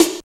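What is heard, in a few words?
snare drum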